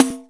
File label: pandiero, Contemporary Percussion; SN-R8-01 Contemporary